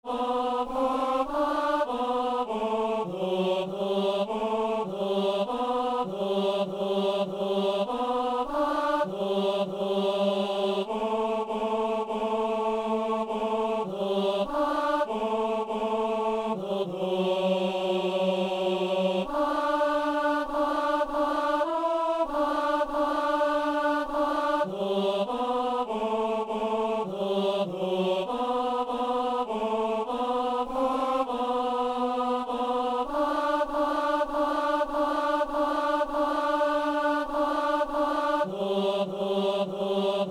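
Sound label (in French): ténor; Peuple fidèle; Messe du 17 janvier à Saint-Denis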